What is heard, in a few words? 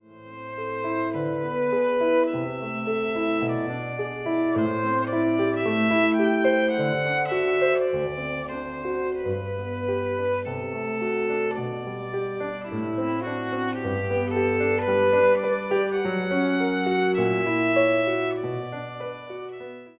VIOLIN